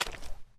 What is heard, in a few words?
mud, Footsteps; zps; Foley